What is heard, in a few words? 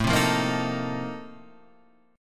A sharp Major 7th Suspended 2nd Suspended 4th A